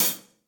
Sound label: Hihat Closed Room With A Vista; Drum Hits; Session Drums Studio; MPE Hits